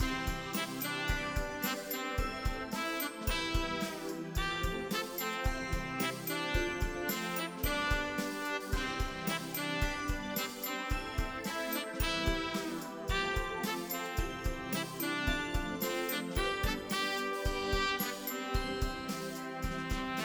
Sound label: Christmas song